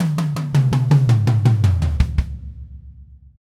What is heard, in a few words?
Red Maple Groove 110, DW Red Maple Kit